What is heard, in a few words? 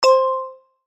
match not ready; KW-! If there was an endpoint